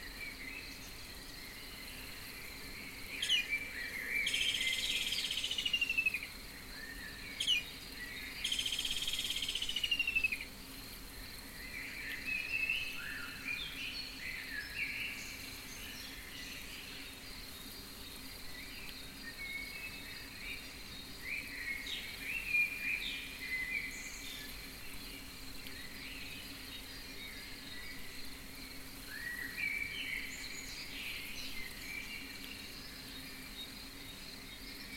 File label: jungle, Ambience